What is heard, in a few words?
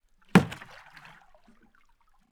Water; TOOLKIT vol.2